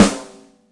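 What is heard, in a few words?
snare drum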